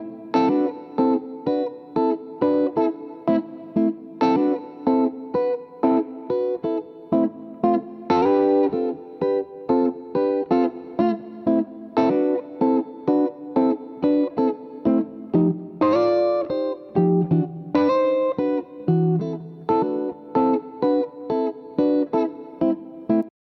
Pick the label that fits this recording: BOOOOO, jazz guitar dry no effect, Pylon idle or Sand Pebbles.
jazz guitar dry no effect